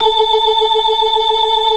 organ; Keith Emerson Signature Series

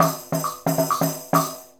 Tambourine and Wood Block

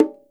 PERC, Percussionworks; Sampleheads; NYC Percussionworks; 90 s Sample CDs

